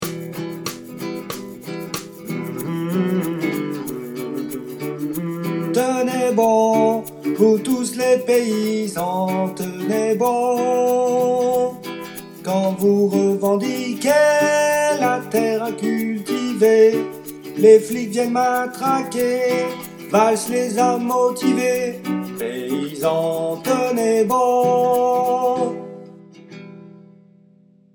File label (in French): Soprani